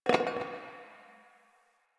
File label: Garbage Metal Can; Warframe; Ambience; Nineteen Ninety Nine; DE